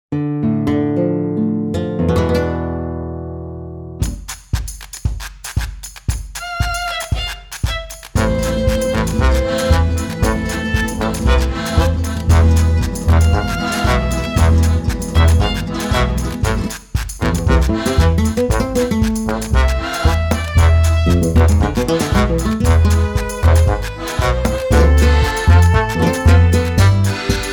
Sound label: Gypsy